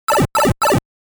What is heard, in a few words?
8bit; supermario dokan